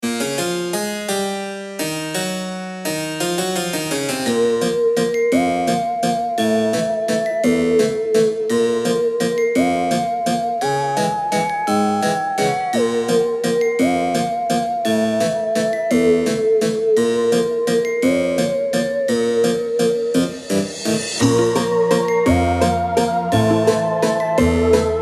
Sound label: halloween